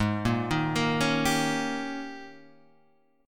Ab Major 7th Suspended 2nd Suspended 4th Ab, down, Guitar, Half Step Down